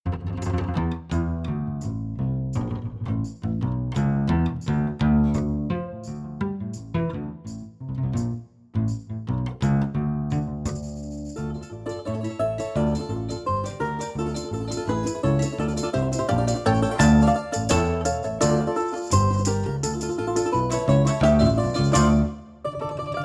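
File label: contrabass balalaika; Ilya Efimov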